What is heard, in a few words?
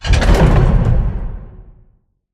crane move start clunk; Titanfall 2